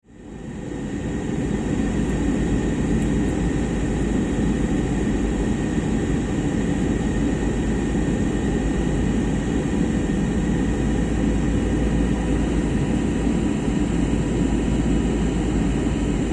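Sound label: airplane landing; samolet prizemliaetsia; звуки двигателя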